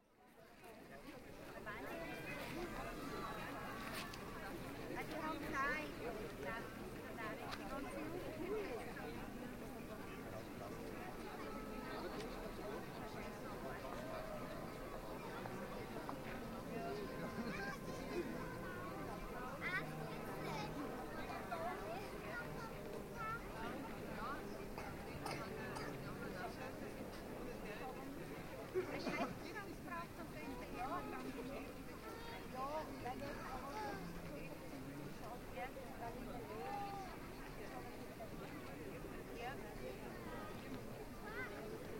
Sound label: Big Clocks, Salburg Glocken, 3D Binaural